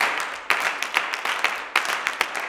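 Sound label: CLAPS 96, Gospel; Blues & Soul; G.CLAPS 96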